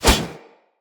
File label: Sword Whoosh; Weapons; Swords; Dendrit Sword And Board